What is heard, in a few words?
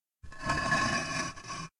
Medium Rumble Walls; SyrinScape; Hunting horror battle